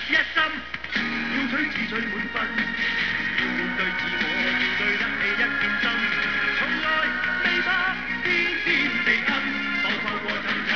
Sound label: rumble